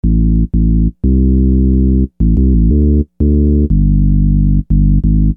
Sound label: Groove Bass, Hip-Hop Street Beats; Construction Kit; Sonic Foundry (Sony Creative Software)